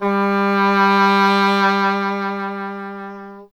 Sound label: WND, Solo Instruments